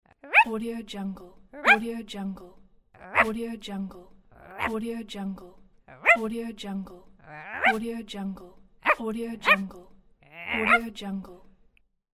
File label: cartoon puppy bark